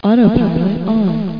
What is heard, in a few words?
auto p on